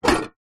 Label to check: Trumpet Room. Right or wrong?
Wrong